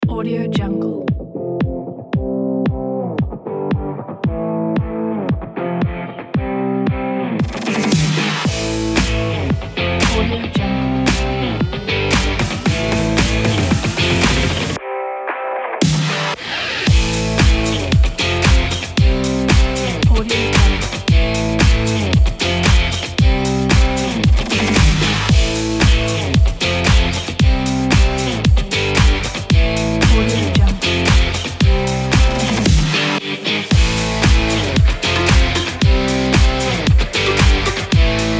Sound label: energetic sports rock dance kit